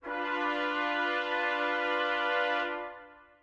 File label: Trumpet B2